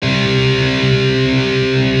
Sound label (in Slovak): Powers akordy c g